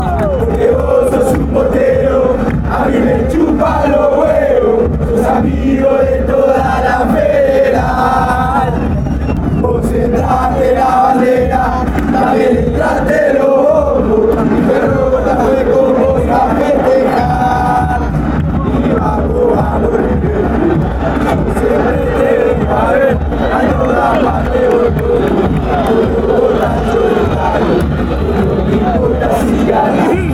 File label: come on come on River Plate, fanchants